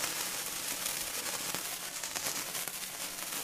Background Sparkler Sound; Pixel Gun 3D

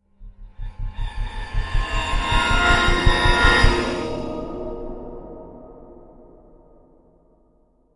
Stingers; Outlast 2; PS4 Windows Xbox One

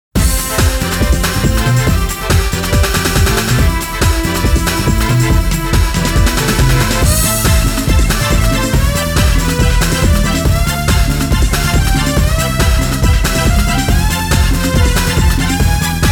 Cicada - Intense Fighting Compilation; Shin Sangokumusou

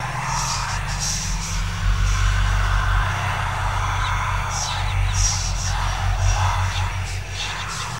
Demonic Whisper Loop; Lucius III; pc linux

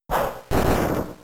Barrage 1hit; pokemon; gen 3; attack moves

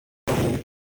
x - plosion, Amiga

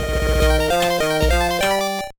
Congratulations!

